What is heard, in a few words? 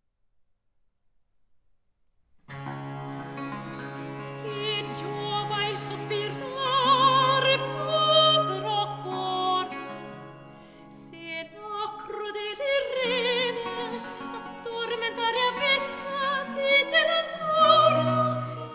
Cantata Recitativo; Cantata RV 679; Che giova il sospirar, povero core; Vivaldi; Bellezza Crudel; 2L